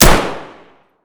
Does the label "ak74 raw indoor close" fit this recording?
Yes